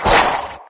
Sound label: hovercraft whoosh; There; ThereClient